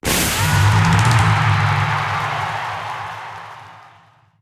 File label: bonus game drums; netent